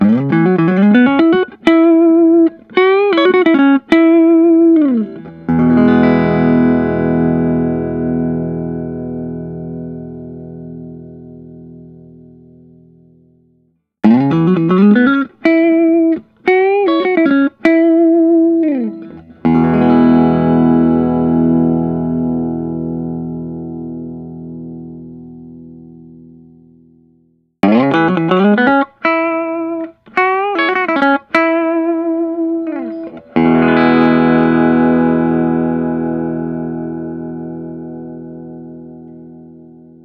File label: Gibson Memphis 1959 ES 335 TDN VOS